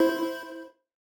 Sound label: calendar reminder